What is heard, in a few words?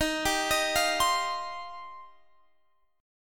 Eb 6th Add 9th Eb, Guitar Standard; arpeggiated; x,18,17,20,18,20